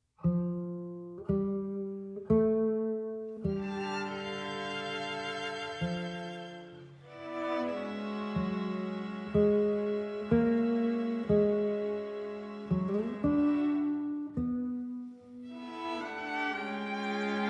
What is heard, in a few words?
clarinet sonata, andantino vivace leggiero